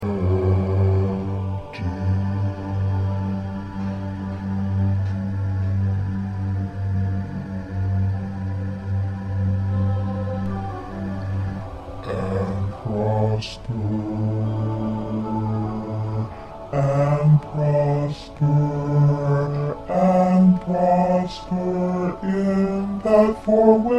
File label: Bass 2